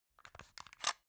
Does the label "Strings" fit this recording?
No